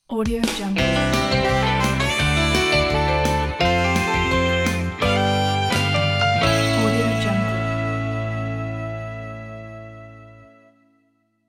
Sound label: sitcom logo